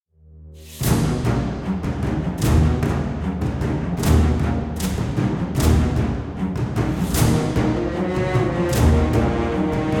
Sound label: Human's Battle; Disciples III Renaissance